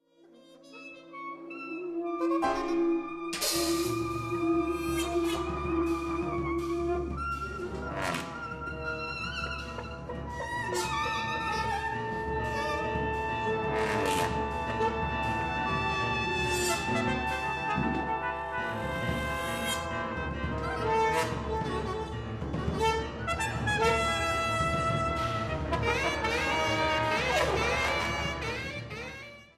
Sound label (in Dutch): Improvisatie